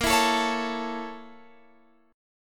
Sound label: A sharp Minor Major 7th Flat 5th A sharp Guitar Open F; A#mM7b5 strummed; JGuitar